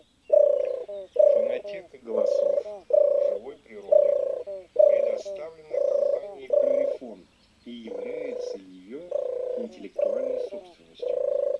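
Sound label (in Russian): Горлица Tortora, манок; plurifon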